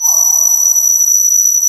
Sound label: CMI ORGAN